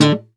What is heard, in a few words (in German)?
KONZERTGIZ, Alpine Volksmusik